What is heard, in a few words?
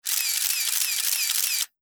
35mm camera shots